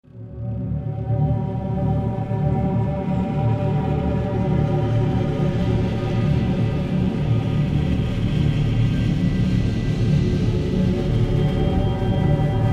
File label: stereo spread before